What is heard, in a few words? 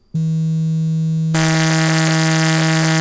Kazoo; カズー